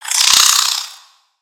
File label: Spider Angry, creatures mechanical; Lego